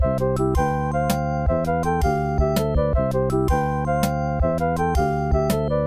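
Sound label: background music; Block Block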